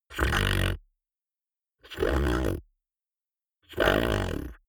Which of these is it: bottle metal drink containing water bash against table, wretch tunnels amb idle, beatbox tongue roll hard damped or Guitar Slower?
beatbox tongue roll hard damped